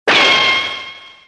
gun

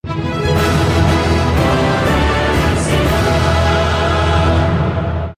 a victory fanfare

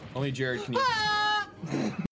grunt

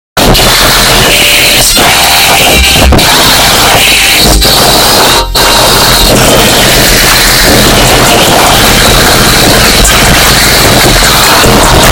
speed ear

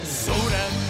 soda

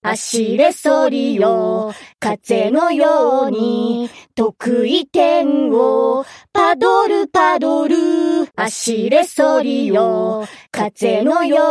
remix singing; artoria; fgo

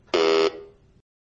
incorrect

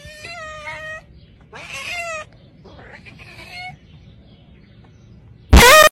meow on a car

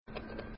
random clicks

